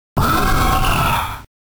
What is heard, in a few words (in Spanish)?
Grito; Honchkrow; Gritos de Pokémon de la cuarta generación